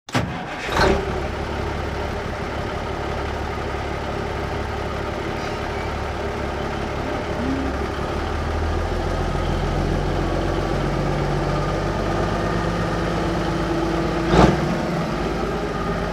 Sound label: 1954 Big Rig Int Start Idle Revs Off; Period Vehicles